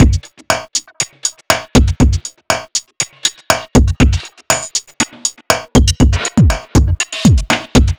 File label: Hop Beat, Drum Loop; Brunk Dunk Hop Beat; Sonic Foundry (Sony Creative Software); The Electronic Music Manuscript Collection; Drum Loop Structures